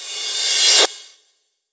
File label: Reverse Cymbal